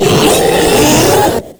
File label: Proto Infested Ancient Vocal Attack; Warframe